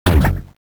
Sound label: drum tom; WarioWare Inc